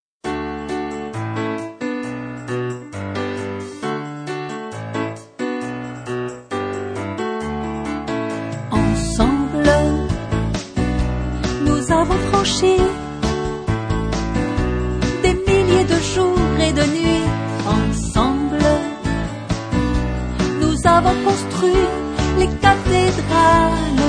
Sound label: ensemble